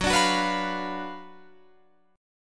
Gb Major 7th Suspended 4th Sharp 5th, Guitar Open F; GbM7sus4#5 strummed; 18,21,18,18,20,18